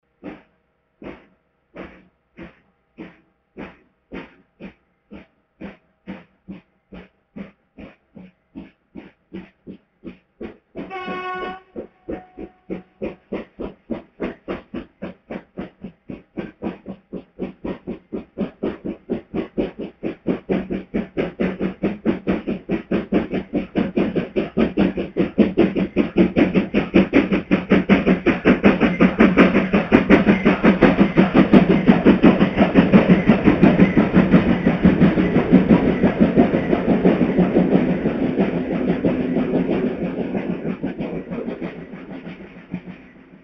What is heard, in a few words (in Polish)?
tloki, parowozy